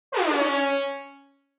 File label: Reggae air horn C3 sharp